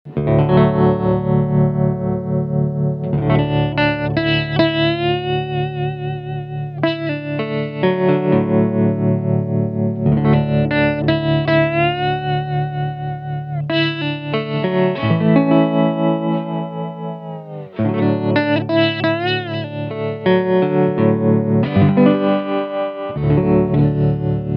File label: vintage trem; mobius